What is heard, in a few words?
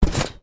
sport trampoline bounce single